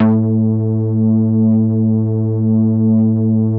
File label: MINI, MOOG BASS